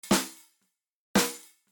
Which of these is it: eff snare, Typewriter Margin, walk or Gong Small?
eff snare